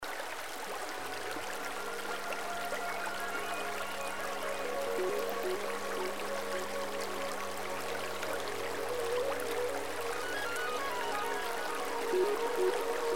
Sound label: Relaxing Music with Waterfall Ambience